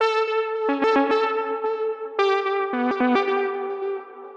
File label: Synth 110BPM